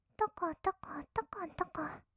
とことことことこ, kawaii voice, 合いの手

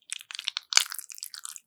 挿入; ASMR

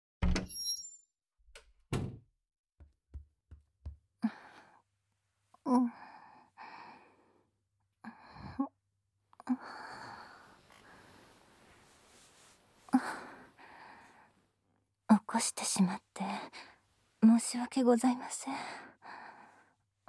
耳舐め・ディープキス・キス手こき・乳首舐め手こき・フェラ; 正常位・密着対面座位(耳舐め・ディープキス); 女執事とのドスケベ溺愛セックス

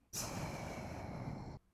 吐く音, ブレス, kawaii voice; ASMR

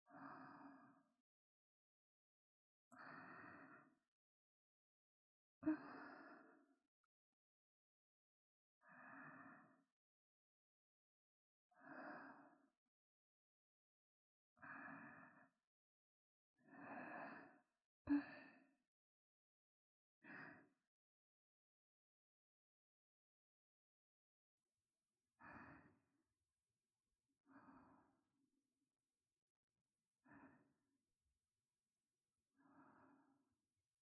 添い寝ループ; ASMR

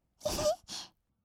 えへー, kawaii voice; 1200 kawaii voice!!; 色々なワンショット

